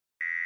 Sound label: Buzz Beep Hi Tech Shr